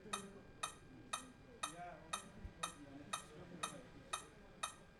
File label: audio beacon